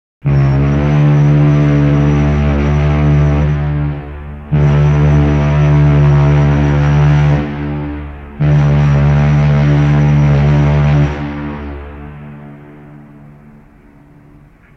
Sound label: Ship Horn, boat horns